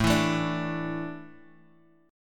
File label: A Suspended 4th; Asus4 strummed; JGuitar